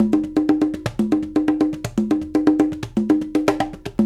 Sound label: 120 TROP; Spectrasonics; Supreme Beats; African Contemporary